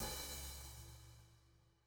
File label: CYMBAL, ELECTRO KIT